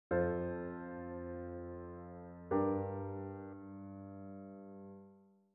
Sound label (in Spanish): cadencia rota sib M; cadencias